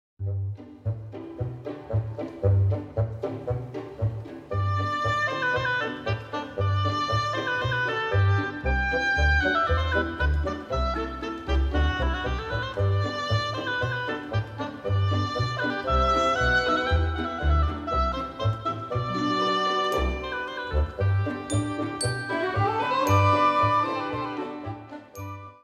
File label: Orchestra